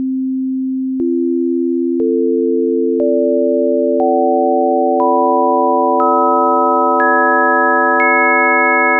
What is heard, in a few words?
bell